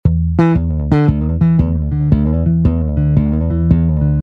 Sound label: Bass Chords E,D,E,D 115, Electric